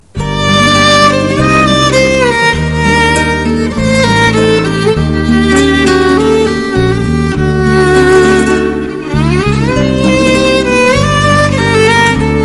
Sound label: emotional